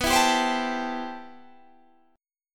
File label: C Major 13th B